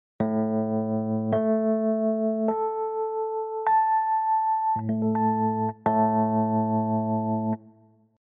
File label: Octaves